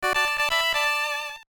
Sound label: Jingle - Vision Clear; Kaze no Klonoa Moonlight Museum